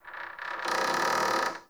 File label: Cave Ocean Dock Creak; Ambience; Midrath Island